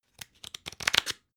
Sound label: playing cards browse